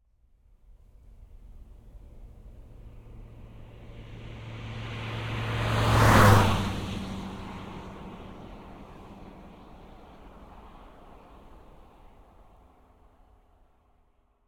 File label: Toyota RAV4 II 2.0 16V Diesel EXT - passby 90kmph; stereo AB; Soundholder